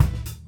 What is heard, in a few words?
HIT